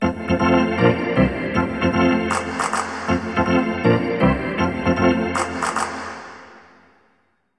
Hockey Organ

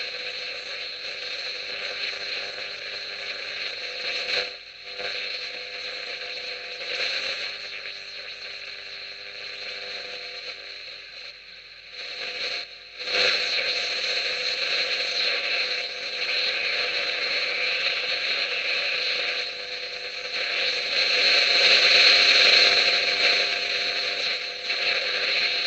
walkie-talkie interfeerence; devices accessories utilities; NS Studios - ultra sound collection

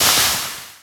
Sizzle Laser Hit Water; SFX Kit; Creaks, Scrapes, & Misc

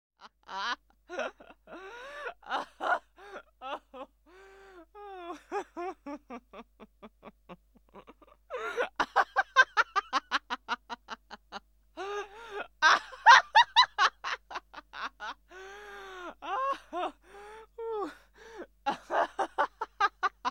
Woman Laughing; KMP Film Video Sound Effects

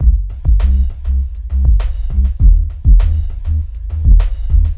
Music Distant Car Deep Loop; SFX Kit; Foley - Misc

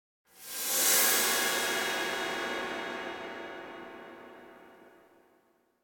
Cymbal Strike Brushes High Tone Whistle Impact; Hollywood Edge; sound designer's toolkit v2

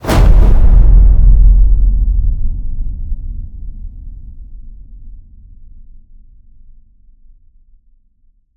HIT Fire, Cinematic Hits Designed

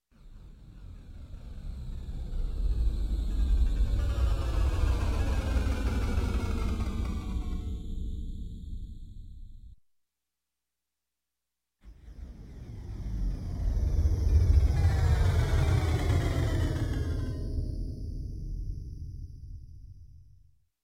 Space Space Harp, Electronic, Music; Sound Ideas 2000 Series

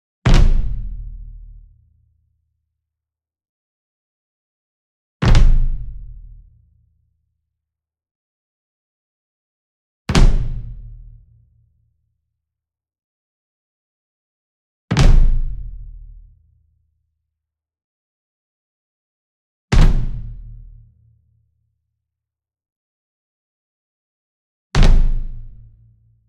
ENSEMBLE DRUM Bass Drum Marching Flam Near; boom library; Cinematic Strikes Construction Kit

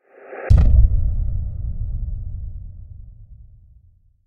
Impact Deep - Subsonic; Hollywood Edge; sound designer's toolkit v2

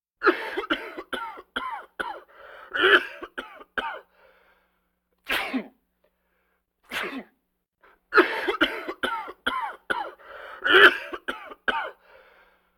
Vocal effects breathing Moaning Baby

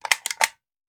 Machine Gun Foley; Sound Ideas; Guns

